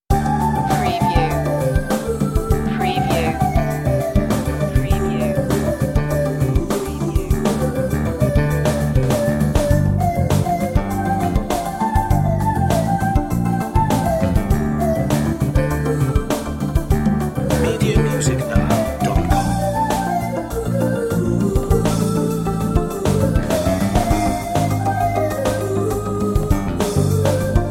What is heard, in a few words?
fusion break